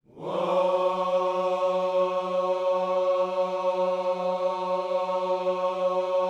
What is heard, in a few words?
WHOA F#3, gospel; Spectrasonics Vocal Planet